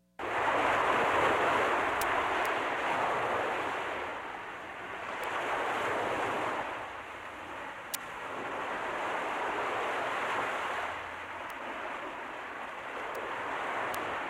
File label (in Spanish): Salsa